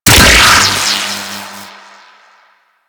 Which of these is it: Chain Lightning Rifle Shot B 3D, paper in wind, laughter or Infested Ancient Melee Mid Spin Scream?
Chain Lightning Rifle Shot B 3D